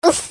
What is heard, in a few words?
Ooff